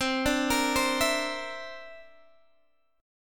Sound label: D 7th Suspended 2nd Sharp 5th C